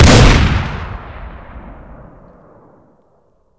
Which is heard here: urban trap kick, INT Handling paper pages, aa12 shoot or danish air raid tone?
aa12 shoot